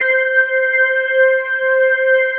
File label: ORGAN, Drum & Bass, 160 BPM; Ueberschall; Drum & Bass Flash Trackz